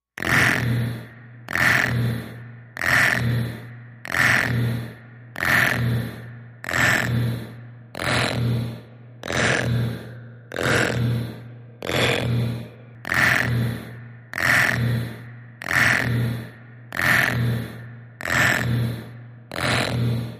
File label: Rip Maker Machine Ripp, energy beams